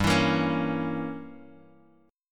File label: G Diminished 7th, Guitar, DADGAD